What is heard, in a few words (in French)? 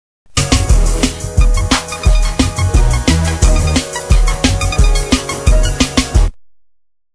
jingle pub; w9